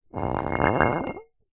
foley glass bottle 1 ltr slide slightly gritty tiled floor